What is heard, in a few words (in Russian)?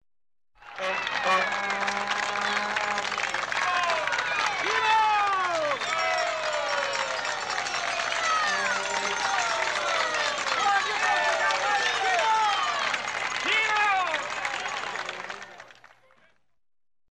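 возгласы и вскрики людей с аплодисментами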